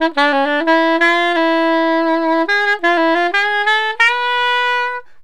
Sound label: SAX